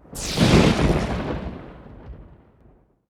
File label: storm summon; Bluegale